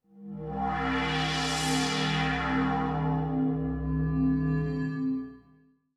SPHERE D+B 160; eJay

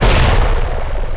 boss destroyed; TumikiFighters

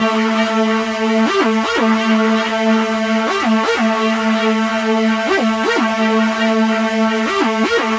PAD; GrooveMaker demo; Serious Software; Macworld 1999-04

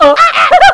Guided Got; Casady & Greene; Crystal Crazy Demo; Guided Missile

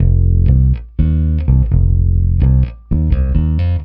RnB, Rock Bass; ACID MUSIC

